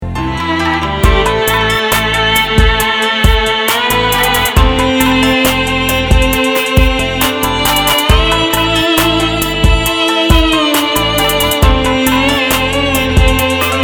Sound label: Loop for trailer